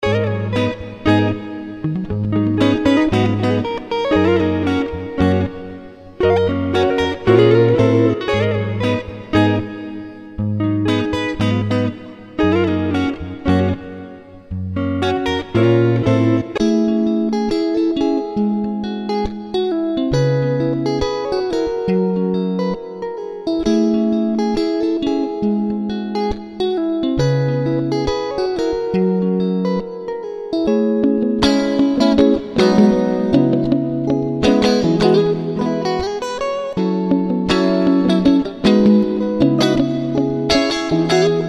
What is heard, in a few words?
Layered Guitar Rhythms